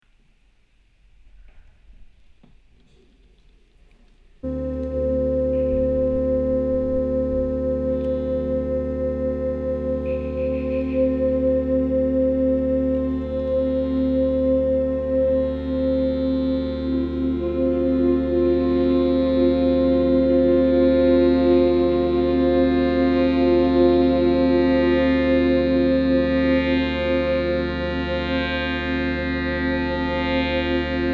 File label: drone